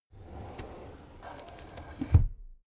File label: drawer cabinet crammed close soft